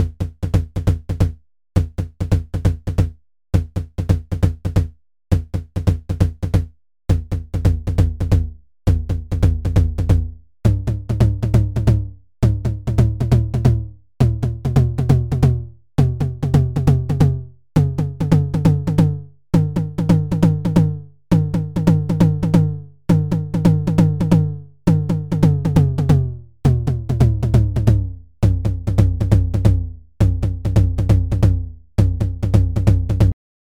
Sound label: Roland TR-09 Tom